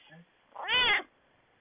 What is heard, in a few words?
cat meow